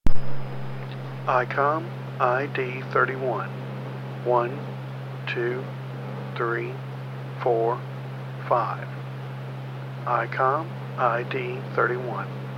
icom id31

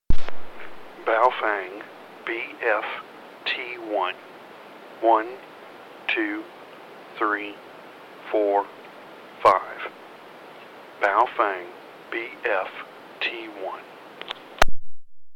baofeng bft1

